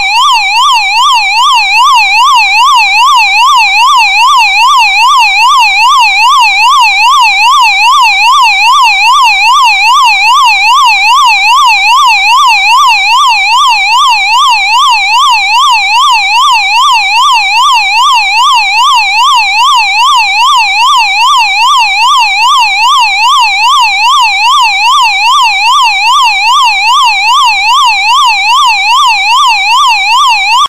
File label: special warning